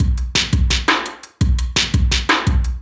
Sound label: Build Beat, Drum N Bass; Sonic Foundry (Sony Creative Software); Knutrix Drum & Bass